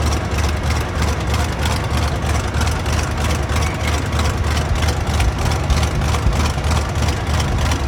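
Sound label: Plane P40 Interior Idle Loop; Airplanes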